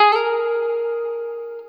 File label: Guitar & Bass; Quantum Leap; 90's Sample CDs